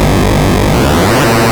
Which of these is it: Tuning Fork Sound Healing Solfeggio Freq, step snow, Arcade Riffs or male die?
Arcade Riffs